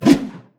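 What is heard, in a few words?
swing blunt, one hand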